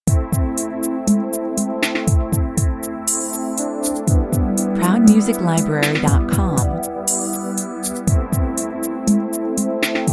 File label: beneath the ocean (talkover) 120 bpm